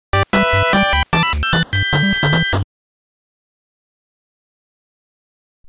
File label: Boss Clear; Armadillo; NES